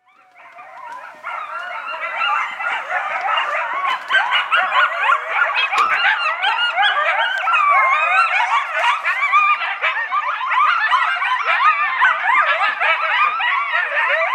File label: coyote calls